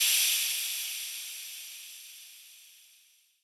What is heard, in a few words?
gas; teardown ps5 windows xbox series xs gamerip 2022